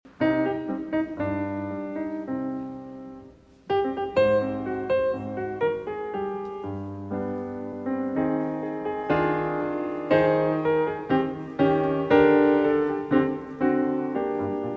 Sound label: Piano Concerto in C Minor, Allegretto (Live); Mozart; Piano Concerto No. 24